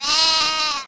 lamb

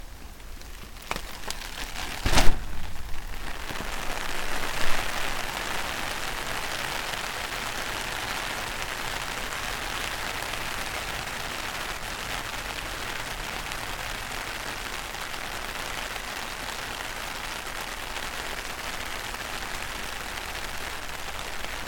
plastic umbrella

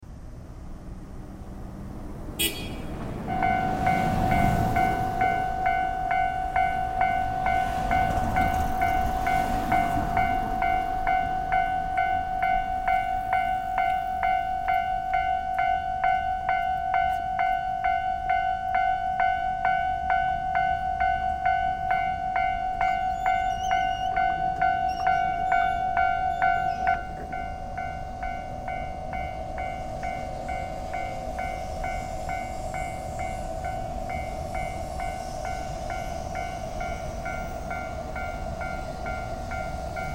trains passing

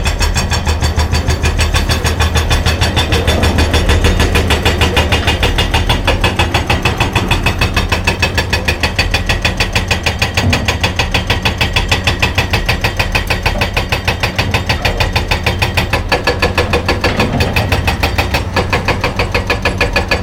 cracking stones

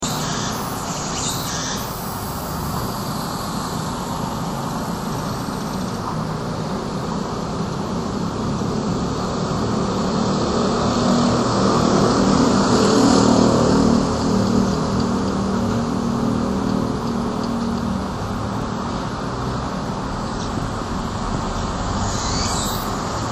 summer streets

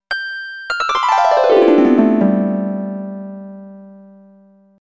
blackout harp; anime

